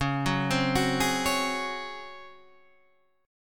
C sharp Major 7th Suspended 4th C sharp Guitar Drop D